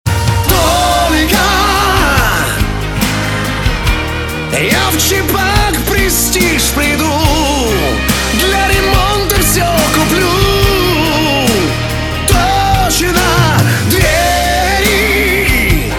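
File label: vocal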